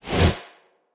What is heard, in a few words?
Whoosh; portal quest android; Shield Minion Attack